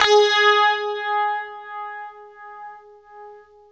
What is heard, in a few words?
UNIVIB G#3, Guitar; East West; Steve Stevens Guitar Samples Collection